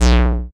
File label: sneak; convergence